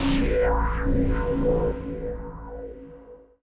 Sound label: TECHNO 140; Techno2